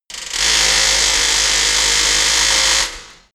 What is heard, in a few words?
pneumatic ratchet wrench; maschinen; bohrer; soundpool